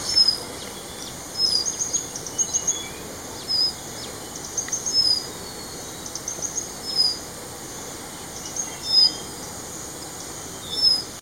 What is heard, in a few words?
White tailed Robin immature calls Cameron Highlands Pahang Malaysia